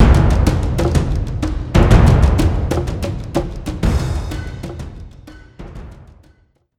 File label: sounds music; Halo Combat Evolved; Xbox